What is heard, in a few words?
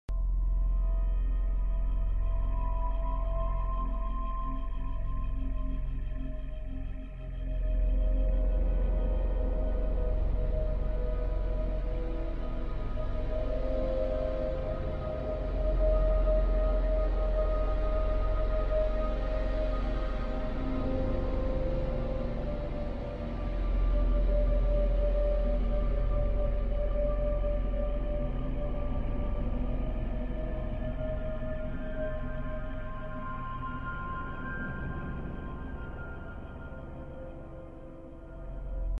Garage Ambient Pad; My Imaginary Friend; Beyond: Two Souls